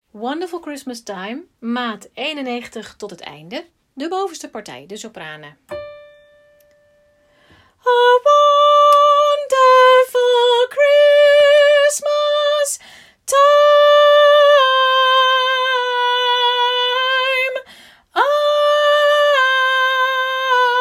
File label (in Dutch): sopranen; kerst